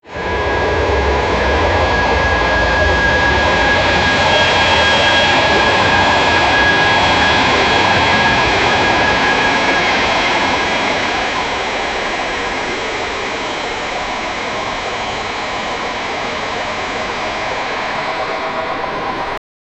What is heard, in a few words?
the sound of the engine, acceleration